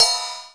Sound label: STD RIDE, MPC-60 DRUMS; Greytsounds VOL-1